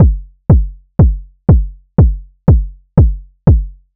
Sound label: kick; visionaire